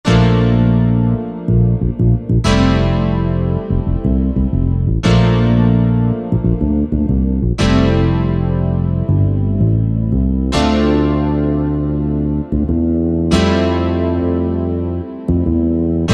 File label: Hip 90's Split, Korg TR-Rack